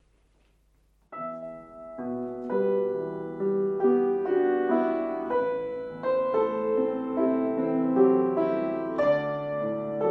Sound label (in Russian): Romans mi minor perelozhenie dlya fortepiano